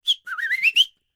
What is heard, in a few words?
WHISTL, ANDES FX World Voices; Spectrasonics Vocal Planet